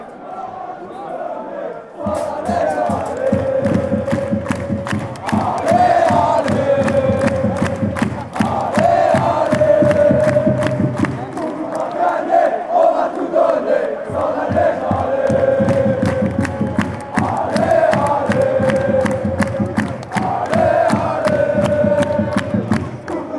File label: allez standard liege ole, chants